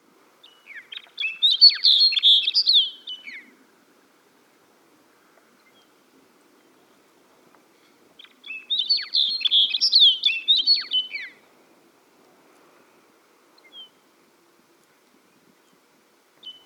Snow Bunting

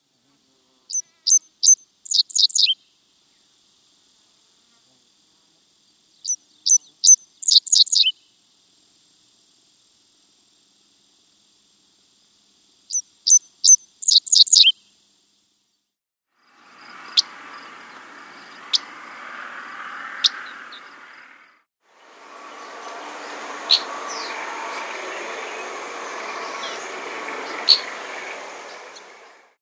Dickcissel

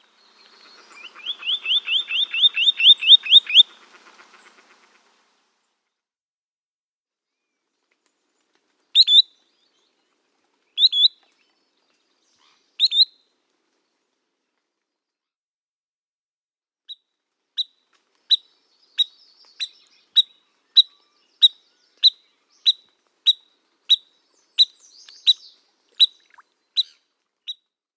Spotted Sandpiper

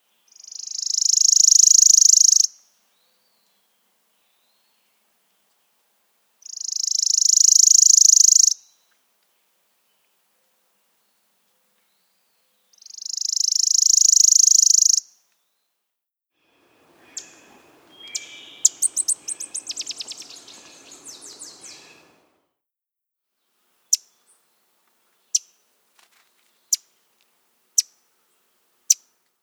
Worm-eating Warbler

